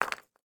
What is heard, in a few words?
phys impact charcol; Rust